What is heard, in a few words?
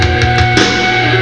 heavy feedback; piet; SPACEKOP